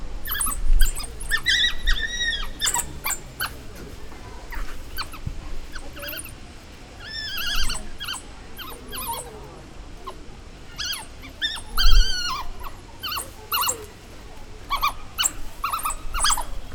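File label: Lycaon pictus aggressive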